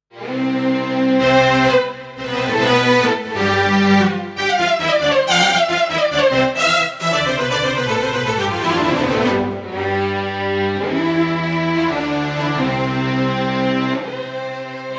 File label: Orch Porta; Pa2x